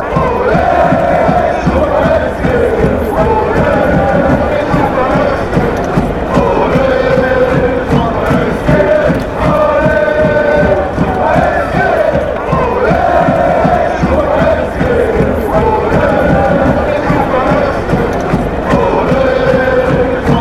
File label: fan chants; super sge